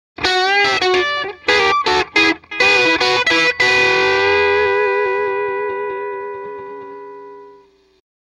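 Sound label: m75 tele bridge pickup country jtm45 straight in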